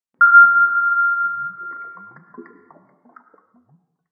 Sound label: sonar submarine underwater